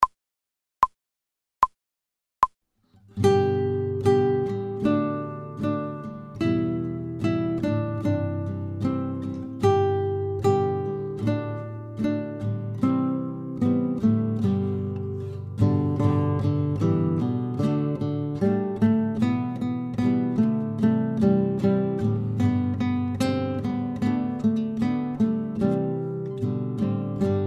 guitar, without metronome; fast

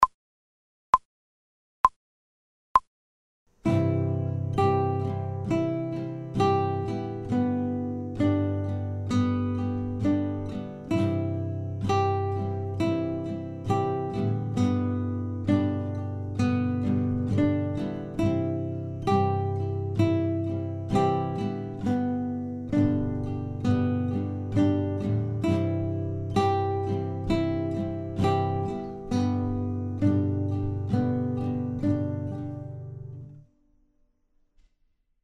slow, guitar; without metronome; Faster Faster

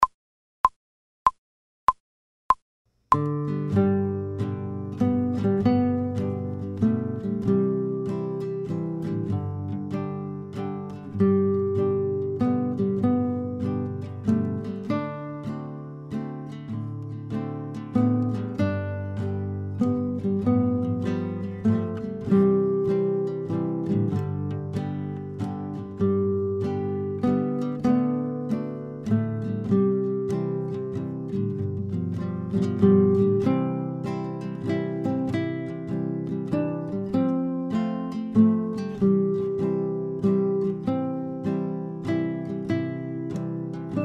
fast, guitar, without metronome; Amazing Grace

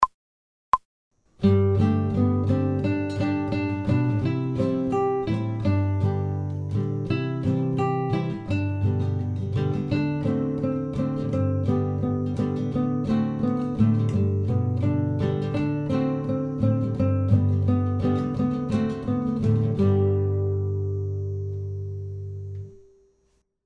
Song Of The Wind fast

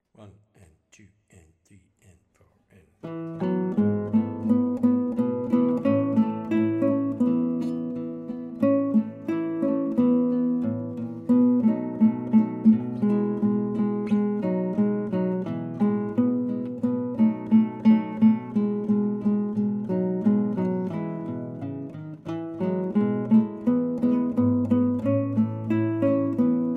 in E, guitar; Song of the Wind

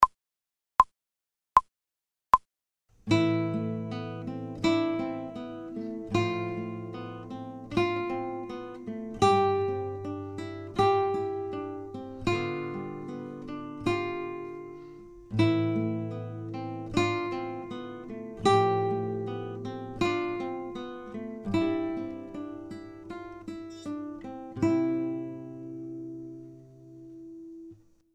slow, guitar; without metronome; Sunday Drive

